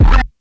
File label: wu perc